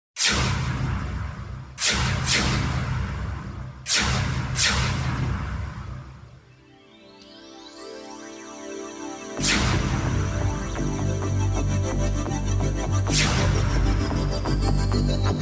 Tandav (Instrumental)

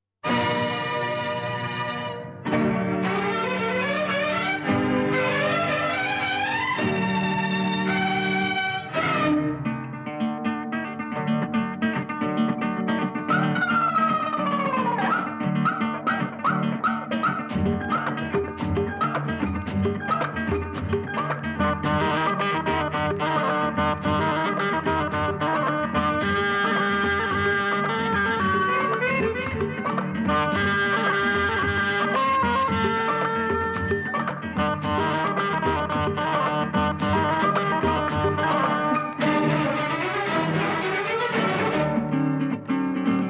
Theme (Instrumental)

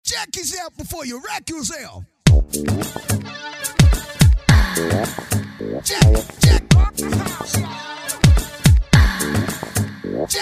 Instrumental

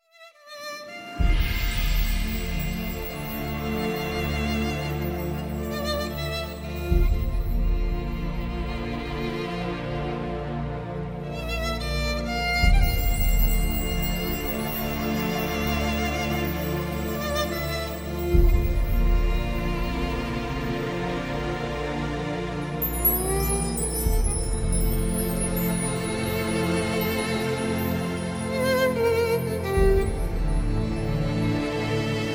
Instrumental

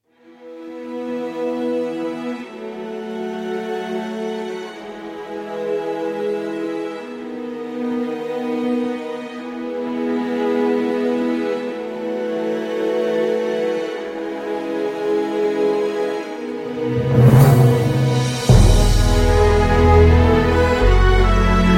Orchestral (Instrumental)

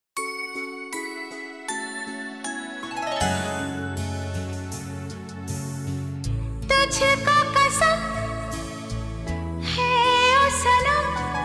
Hero Hindustani (Sad)

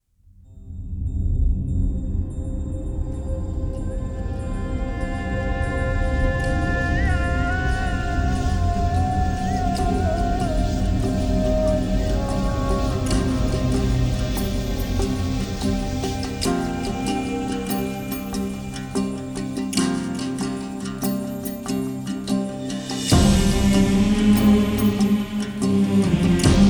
Instrumental

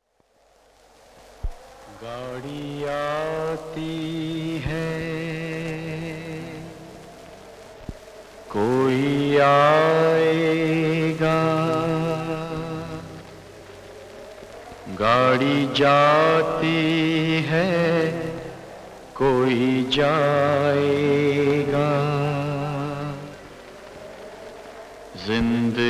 Duet

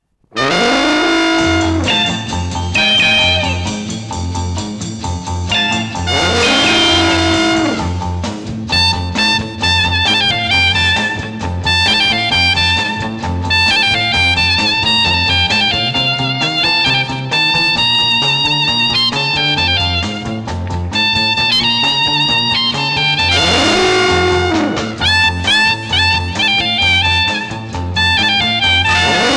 Instrumental

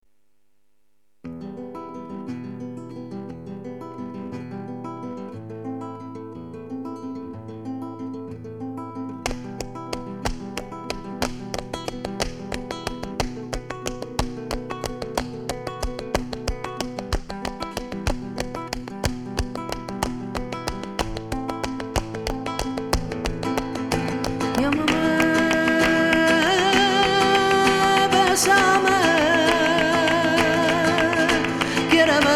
Instrumental Love Theme

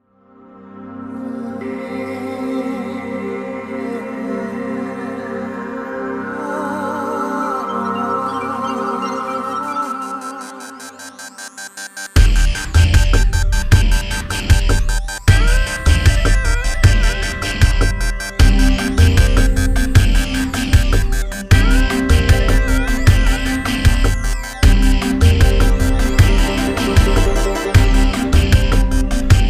Instrumental

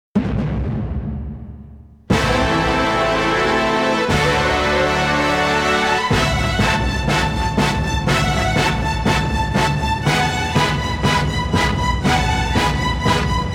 Mamta Bhare Female

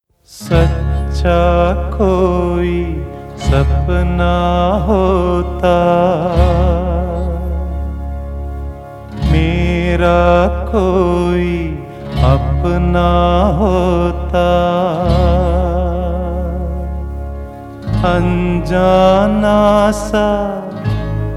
Sad Version

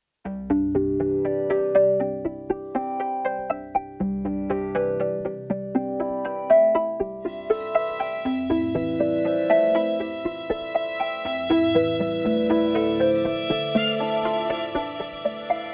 Kamancheh Mix

